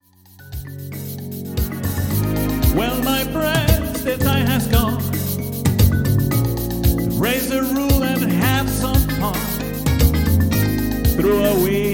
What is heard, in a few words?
with voice